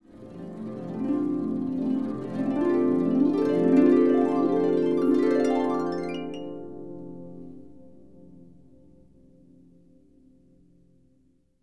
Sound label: Harp